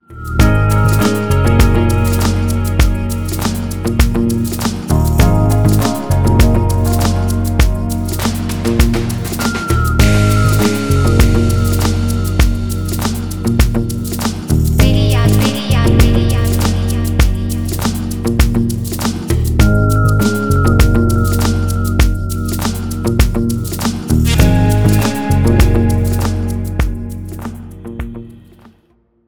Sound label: Lounge